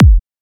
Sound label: Kick, psytrance; Yellow